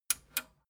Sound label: metal button radio click disable slow